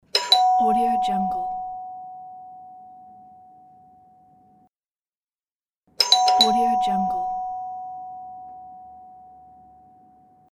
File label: doorbell; soundfood